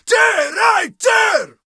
Battle Cry, Viking Vocal, Un-Processed

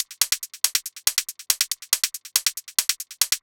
Hi Hat Loop, Psytrance

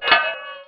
fx metal

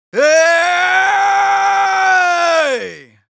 Yells, Viking Vocal

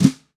Brass Brush Flam, Snares; Drums; Ghosthack - Free Snares 2023; Blk Brass

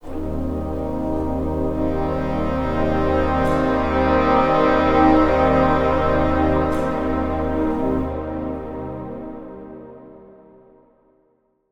Brass Swell - Cm 60Bpm; Construction Kits; STEMS; Ghosthack; SkyRealm; Kit 06 - Before The Storm

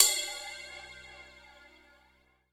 Cymbal (Helium), Analog Drum Kit; Cymatics - ANALOG EVOLUTION Beta

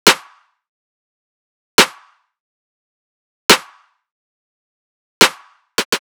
Riddim Drum Loop 140 Snare; Ghosthack; Riddim Essentials Volume 2; Full Drum Loops

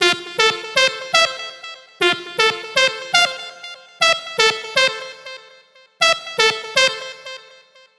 Loop Melodics 120 F; Deep House; Loving You; Ghosthack; Advent Calendar 2019

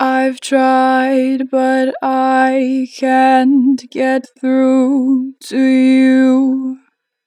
Natural Vibrato, Vocals, Get Through, 4 bar vib; Harmor Vocals

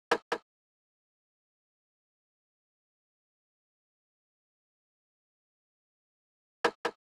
Drum Loop 147 BPM Percussion; Loop Stems; Cymatics; Vibes II Drum Loop Collection; Sparkles

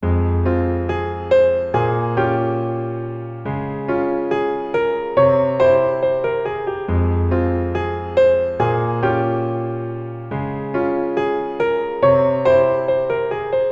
Piano Loop 140 BPM F Min; Piano Loops; Future Bass; Instruments; Moonlight

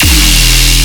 Bass Hit E, Dubstep; Vortex Void; Ghosthack; Demolition

